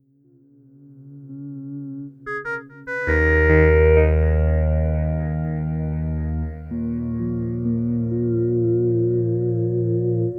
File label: Crumar Performer Original Phaser